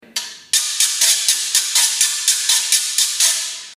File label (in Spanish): Rallador Coco